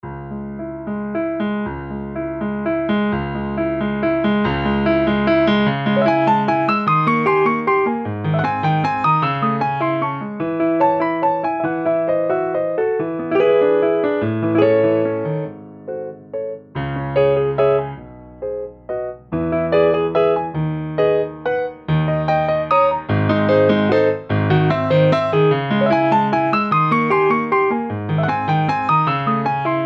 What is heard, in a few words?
piano